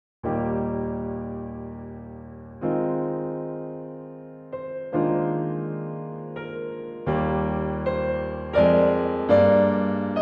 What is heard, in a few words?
Solo Piano, Adagio; Violin Concerto, Op. 26; Bruch